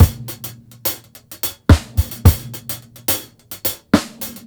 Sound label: beat, Roots Funk; USB Soundscan vol.41; AKAI